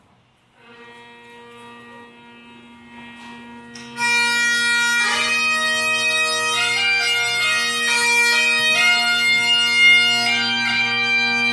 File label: bagpipes